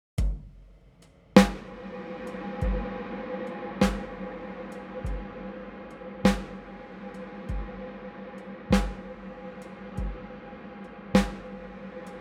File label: Blackhole iOS Quasar Trails Drum